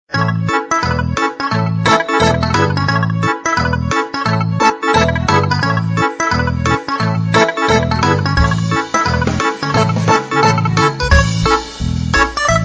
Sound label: funny ringtone; Angry bird